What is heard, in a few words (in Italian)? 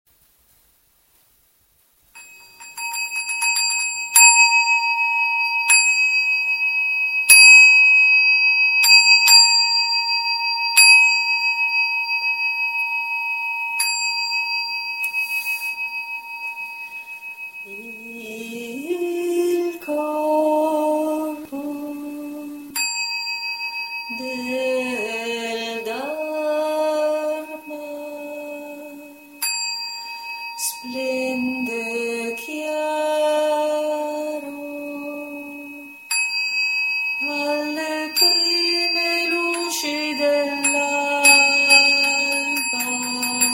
Canto del mattino con campane; canti per le cerimonie e la pratica formale